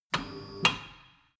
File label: tire changing machine turning platform short